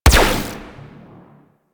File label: Amp Chassis Fire, Amplifiers; Warframe; Operators; Corp Amp Set One